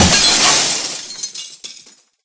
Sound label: glass smashable large break